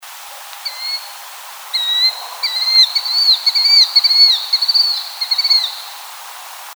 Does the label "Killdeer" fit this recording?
Yes